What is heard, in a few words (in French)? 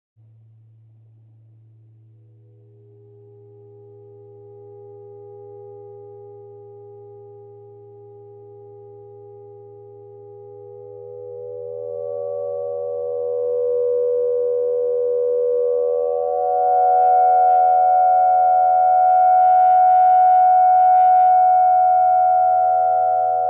aigu, aeolian